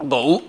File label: gulp; 7invasores